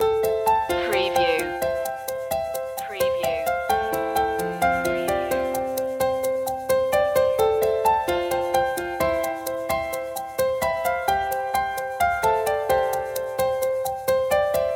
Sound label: cheerful inspiration loop